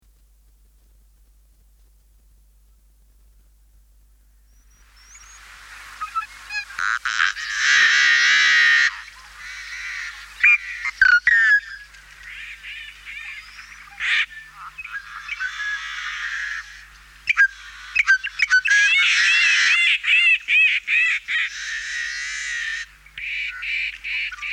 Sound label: yellow headed blackbird, songbirds